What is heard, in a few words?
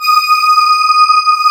CMI ORGAN; Wizoo - Powered DX